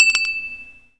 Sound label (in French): cloche